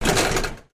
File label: bus door